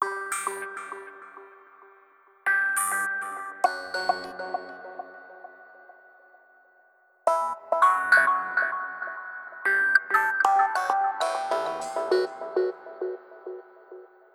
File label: synth Glitch Rhythm 100bpm G